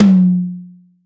low mid tom single hit f sharp key; tom drum samples

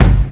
focused kick drum g sharp key; Duration 0:01

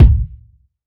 large room bass drum single hit c sharp key; kick

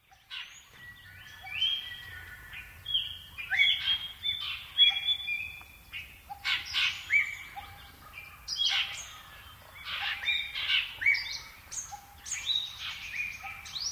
fantails - prinias - barbets birds; soundscapes

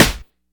loud steel snare drum sound a sharp key

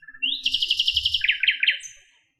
blackbird song; soundscapes

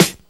old school natural snare sound a sharp key; snare drum samples

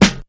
thick snare g key; snare drum samples; drum single hits one shots

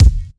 rich mids kick sample d key

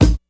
rich top end kick single hit a key; kick drum samples

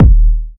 verby steel kick drum sample d sharp key

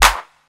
old school rap clap one shot f key

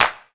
hand clap sound G# key; percussion; single hits one shots

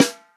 tight lows snare drum sample c sharp key

